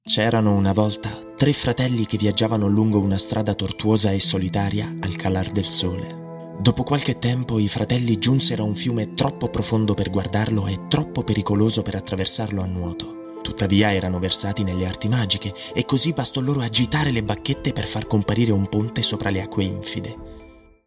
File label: spot music